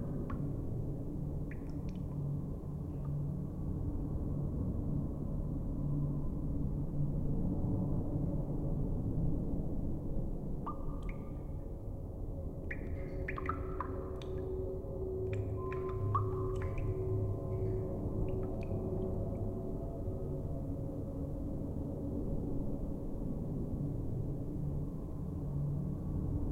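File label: dank cellar, video game soundtrack; escape from frankensteins tower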